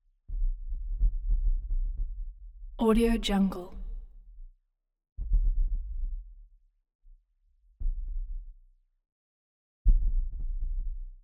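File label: metal smash low frequency effects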